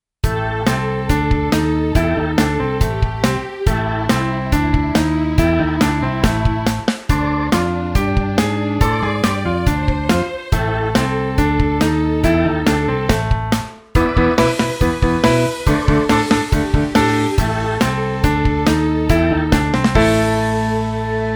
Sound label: Overdrive